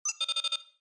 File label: Beep; Star Trek